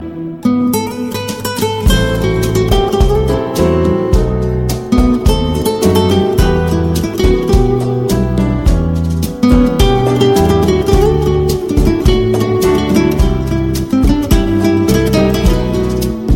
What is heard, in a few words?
ringtone Classic